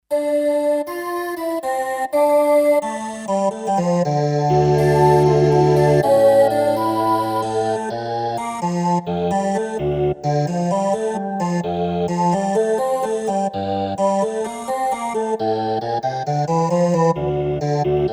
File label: Vocodor, Kawai K5000S